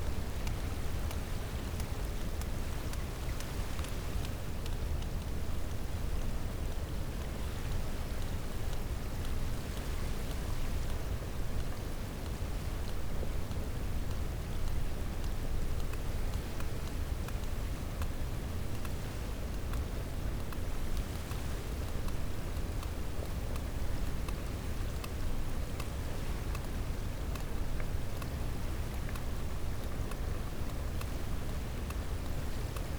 cachalot positif, Physeter Macrocephalus

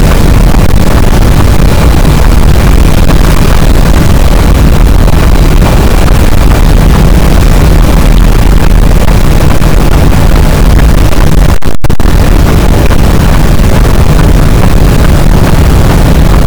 cachalot, boat; Physeter Macrocephalus

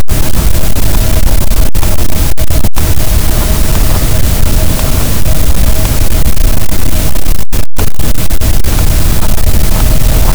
cachalot, Physeter Macrocephalus, gaussian noise

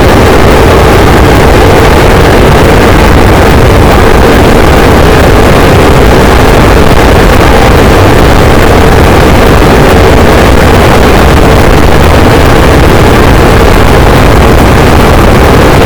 cachalot negatif, boat, Physeter Macrocephalus

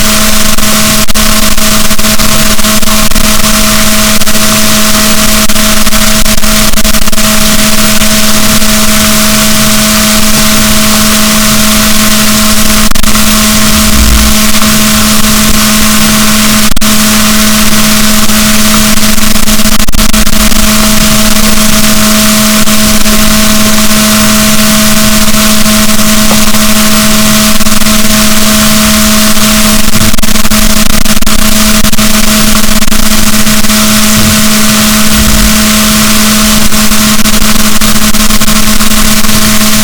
rorquals positif, BalaenopteraPhysalus, boat; SNR 6dB

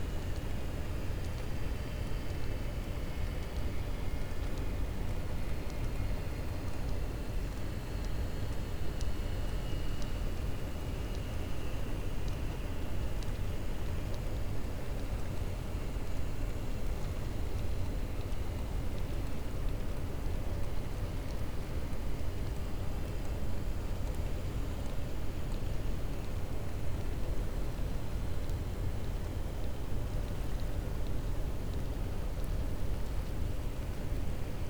cachalot positif, Physeter Macrocephalus

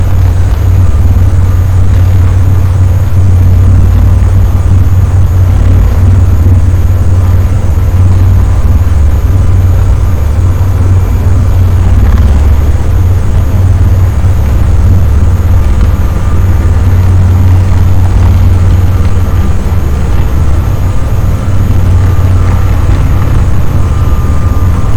cachalot, boat; Physeter Macrocephalus

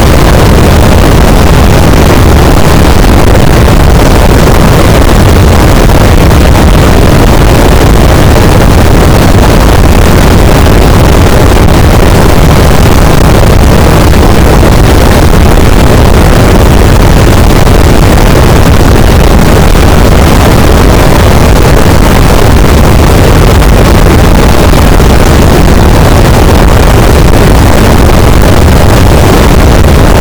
cachalot negatif, boat, Physeter Macrocephalus

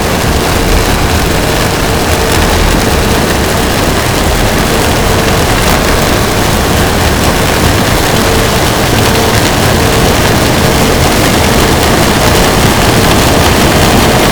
cachalot negatif, Physeter Macrocephalus

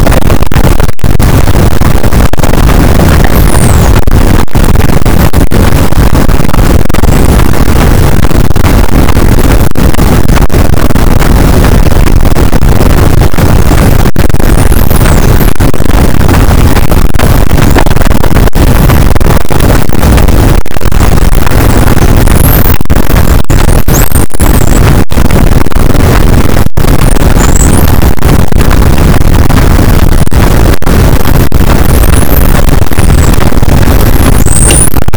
cachalot positif, boat; Physeter Macrocephalus

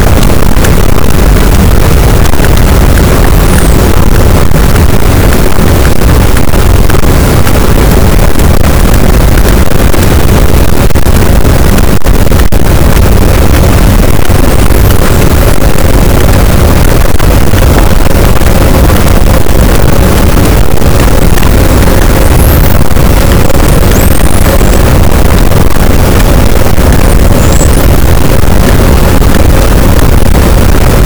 cachalot positif, boat, Physeter Macrocephalus

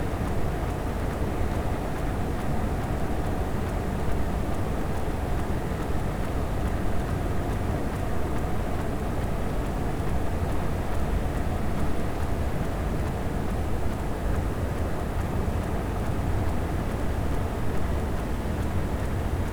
cachalot negatif, Physeter Macrocephalus

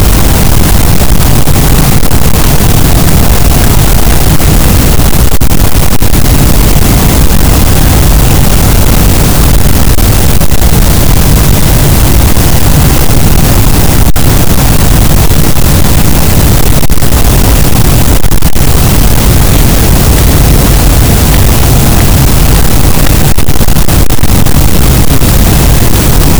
cachalot, Physeter Macrocephalus, gaussian noise

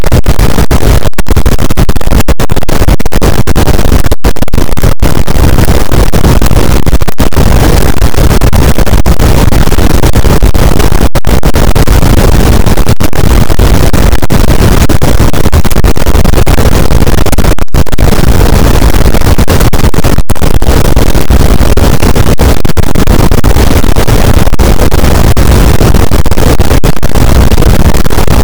cachalot positif, boat; Physeter Macrocephalus

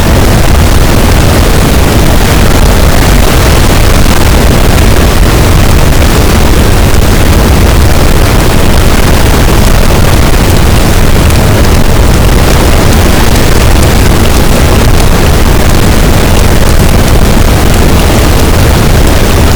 cachalot positif, boat, Physeter Macrocephalus

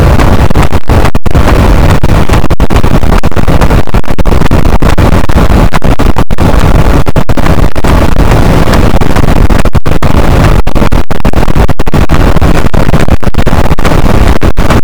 cachalot negatif, boat, Physeter Macrocephalus

